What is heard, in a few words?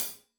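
hat closed, hi-hat cymbals stereo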